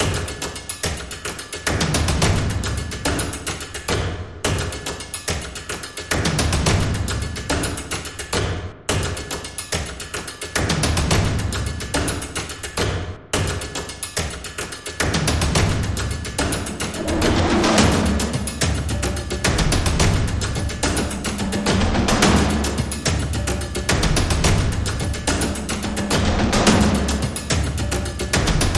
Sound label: Drums and SFX